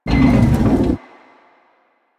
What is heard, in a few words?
Sliding Door Open; Warframe; Ambience; Nineteen Ninety Nine